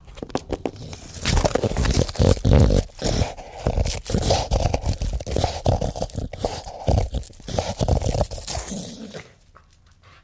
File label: Pit Bull Eating